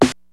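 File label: 909 House; Rubber Chicken Software Sample Bank; DRUMS + PERC; OTHER DRUMS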